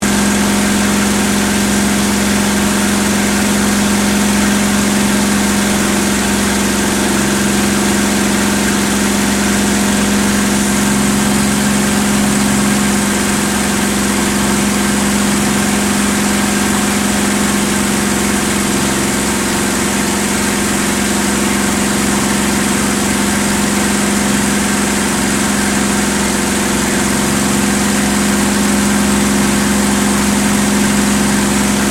AIRCRAFT CAC CA-18 MUSTANG MK23 FLYING INTERIOR STEREO, WW2 Mustang fighter plane; Aircraft Cac Ca-18 Mustang Mk23 1950; Airplanes